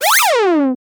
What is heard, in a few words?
a quick 01 02 second soun, power-up